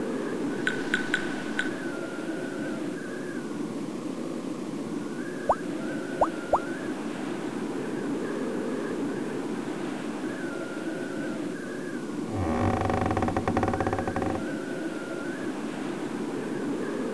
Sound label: Env dungeon